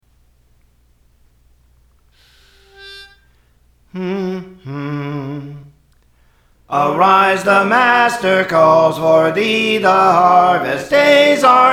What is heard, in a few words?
Soprano; Praise For The Lord Training